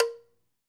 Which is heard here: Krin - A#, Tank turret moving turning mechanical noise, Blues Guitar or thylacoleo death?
Krin - A#